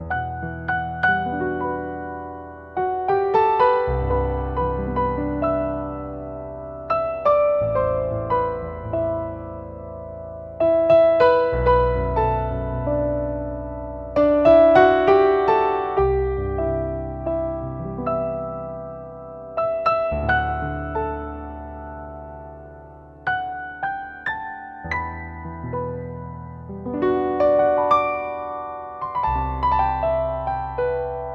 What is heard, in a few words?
Concert